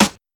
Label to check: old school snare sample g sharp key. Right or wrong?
Right